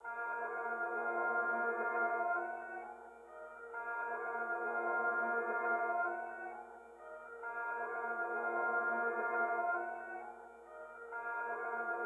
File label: Arp Pad